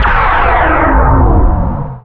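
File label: Laser Fire Interior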